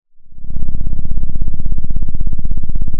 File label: Sound of an engine revving